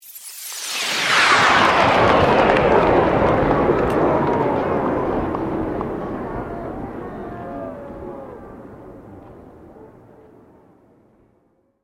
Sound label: Sweep Slow; Created sweeps, swooshes and other movement effects; Sweepers; Sound Design; Foundation4